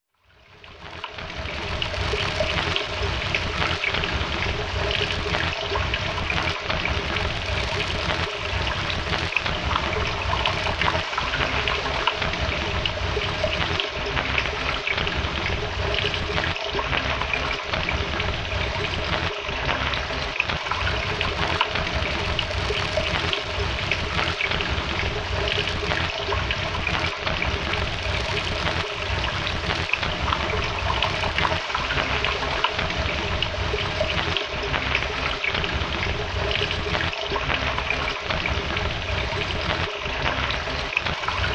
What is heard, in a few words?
White Noise, Water Noise